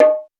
Roland U220 Electric Set, Drum Machines

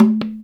NAGARA; InVision Interactive

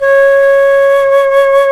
FLT C FLT, C Flt Brt

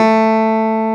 CLAV A; Sonic Reality; Vintage Timetraveler; CLAV PIANET

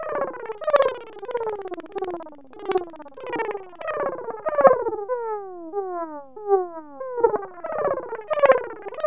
vibration; Zero-G Ambient 1; VIBRATIONS

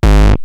Roland TR-909 BD; BD Selection

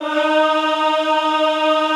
EHH D#3, Classical Choir; Best Service

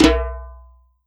MIXED DRUMS

